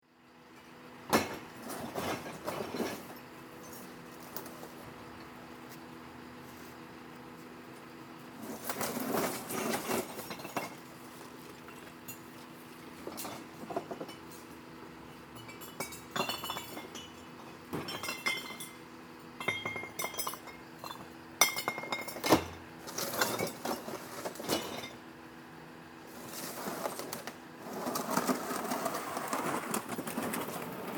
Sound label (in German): Glascontainer